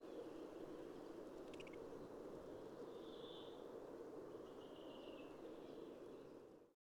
Northern Hawk Owl Very quiet chitter call of nestling